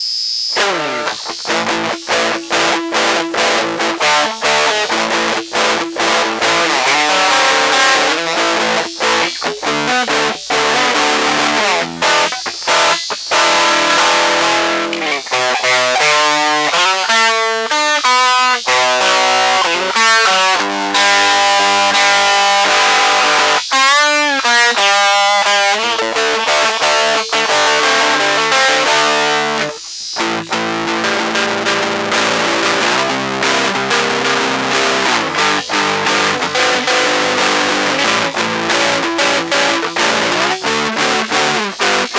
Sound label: Fuzz Test